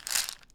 Roll, Pill Bottles; Allergies

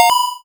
Retro Pick Up Coin Stereo UP; FreeSFX; GameSFX

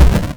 hit deep

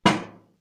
metal

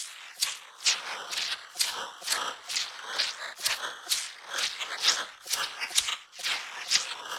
130bpm Small Medicine Bottle Shaker FX

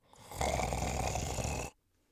sleep, orc voice; Orc Voice Pack

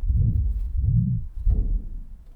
Multi Woom, chairmat; Micro Pack

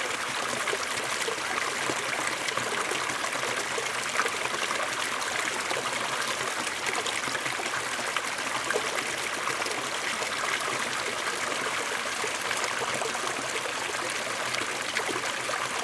Ambiance Waterfall Calm Loop Stereo; Nature; Essentials Series NOX SOUND

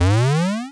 jump big, square waveform; Square Waveform sfx

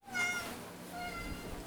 Cat Meows - Food Time; Micro Pack

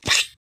mud

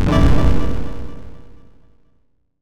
Retro Event Wrong Echo

